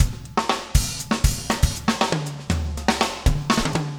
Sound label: funk 120 bpm, loops